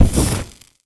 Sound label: Fireball Impact Burn